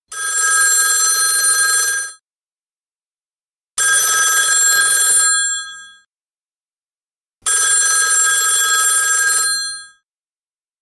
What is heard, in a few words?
Classic Phone; HTC